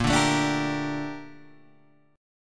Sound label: Bb Major 13th, Guitar, Open G